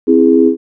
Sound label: cluster sine